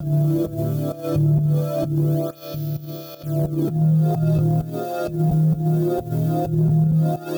Chd Fx Loop 130; Equipped Music; Loungin House

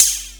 open hihat; Techno Trance Essentials; DRUMS

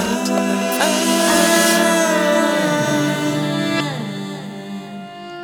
Smoooth E3, Lounge Atmospherez

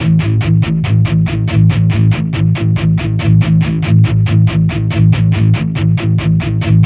Distorted Guitar 140BPM A; Distorted Guitars; Vengeance - Essential Clubsounds Vol.4; Guitarloops

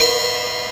Casio RZ-1, Drums

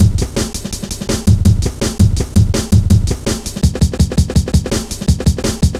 Hard Edged, Drum Loops, 165.5; Zero-G Jungle Warfare 1, 2 & 3

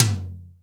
ATTACK TOM; Big Fish Audio; Synth City

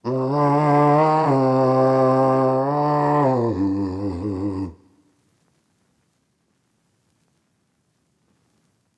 Soul Vocals Gm (Wet) 140 BPM, Melodies; 99Sounds x Monosounds

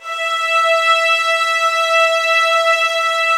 E5, soft violins; Q Up Arts; Denny Jaeger Master Violin Library

